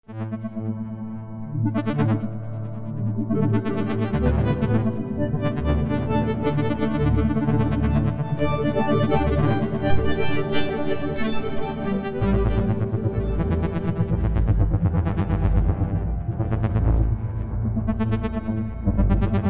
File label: pads fog; dense fog machine